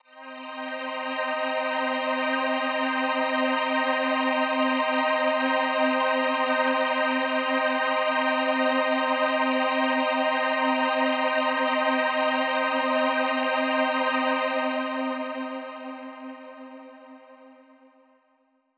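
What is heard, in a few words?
Mach String C minor 7, synth orchestra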